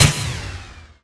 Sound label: Chain End